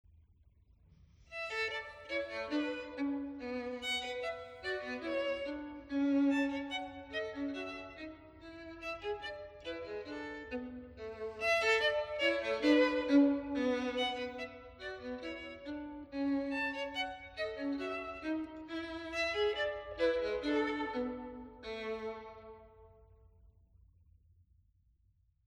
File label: Canon for 2 Violins; Beethoven; Chamber music